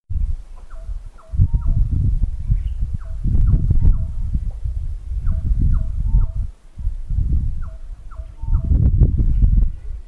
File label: birds morning, rwanda kigali